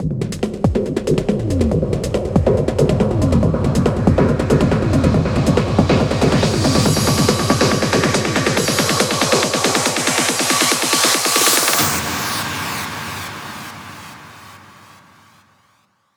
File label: 140BPM 8Bars, HandsUp & Trance Fills; Hero; Vengeance Samples